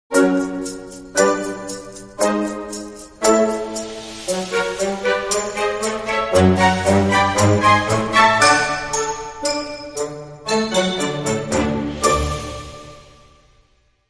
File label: Dramedy Sting